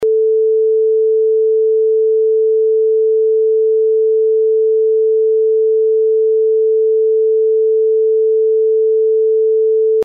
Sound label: 440Hz -6dB 90deg phase